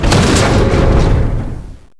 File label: break door Heavy; SAS: Zombie Assault 4